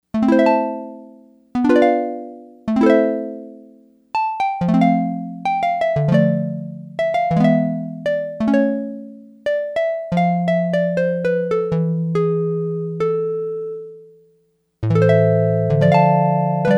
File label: Soift Harp, Yamaha DX100; Factory Patches